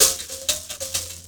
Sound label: MOUTH BOW PHR; Spectrasonics; Heart of Africa 2